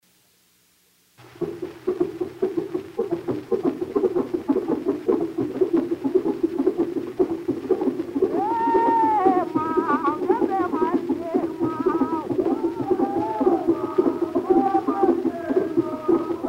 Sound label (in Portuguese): Tambor de crioulo do MA